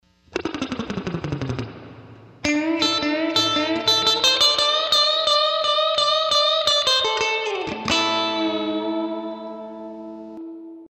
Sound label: Surf